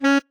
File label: marimba bat; ゆめ2っき